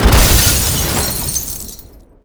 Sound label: impact car crash